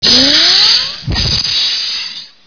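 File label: energy pit south; ambience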